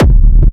Industrial Techno Kick oneshot F, Nicely shaped, crispy and reverbed industrial techno kick; hard techno, peak time techno